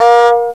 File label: FAIRLIGHT CMI IIX, STRINGS